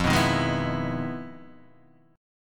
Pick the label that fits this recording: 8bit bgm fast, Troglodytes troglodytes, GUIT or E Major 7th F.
E Major 7th F